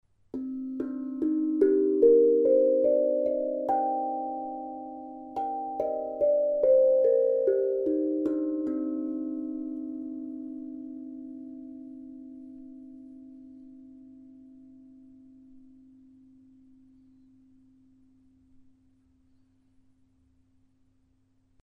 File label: pygmy handpan; zenko